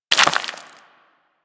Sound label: Bullet Impact Debris Rock; Warframe; Weapons